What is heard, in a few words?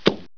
arrow, weapons